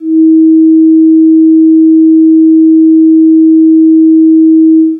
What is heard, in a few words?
nature relaxation sounds